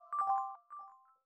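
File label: bell glitch C major; granular; accents; bespoke - Floral v.2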